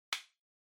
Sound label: Portable Radio Cover Close